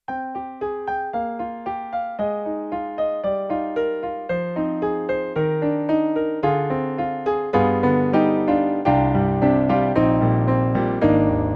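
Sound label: YAMAHA MOTIF-RACK ES Glasgow, piano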